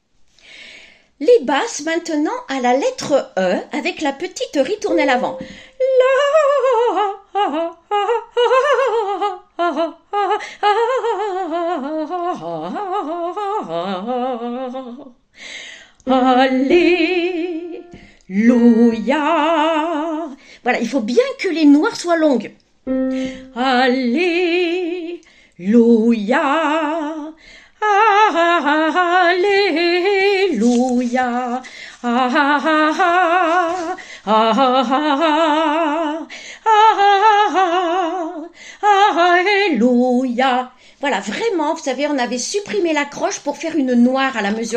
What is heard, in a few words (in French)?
BASSE; BUXTEHUDE